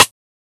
subtle reverb acoustic snare c key

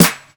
crisp snare one shot f key, tuned drums

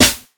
clear snare sample e key

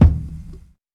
huge kick one shot d sharp key; kick drum

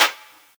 wet snare drum sound g sharp key; snare drum samples

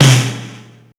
tight low end acoustic snare sample c key

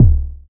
kick drum c sharp key; kick drum samples

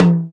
low mid tome drum sample f key; tom drum samples; one shots

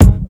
natural kick drum single hit g key

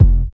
nice kick sample f key; kick drum samples

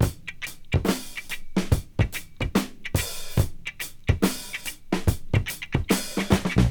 72 bpm breakbeat sample g key

